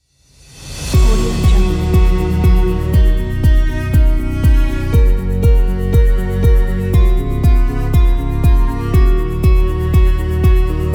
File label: energetic uplifting summer folk; artrecordssoundwaves